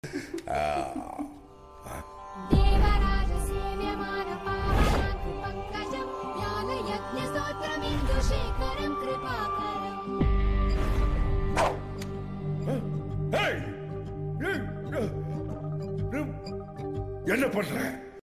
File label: devotional bgm god music; dd returns